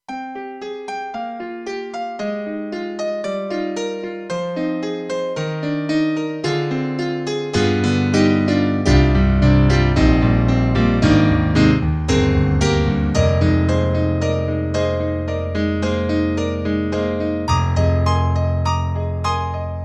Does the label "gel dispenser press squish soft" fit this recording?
No